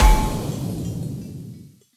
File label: Mech Punch; StyxMech; Going Under